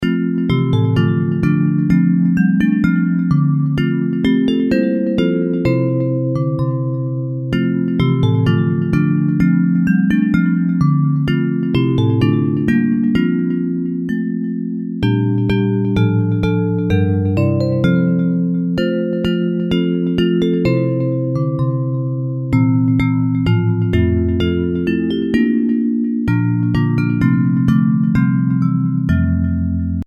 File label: Bells; Hymns